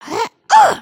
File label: soccer